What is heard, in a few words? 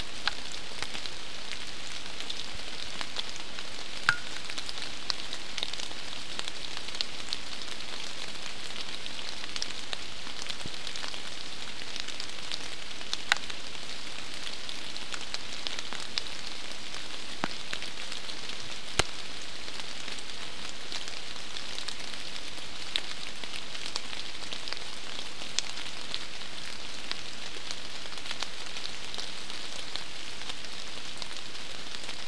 mainly rain